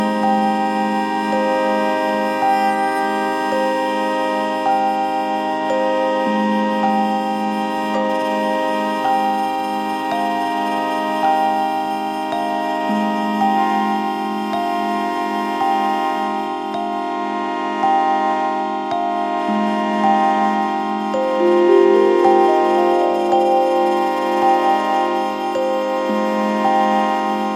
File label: Vibraphone, Ebows, Glockenspiel